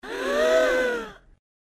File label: Crowd surprise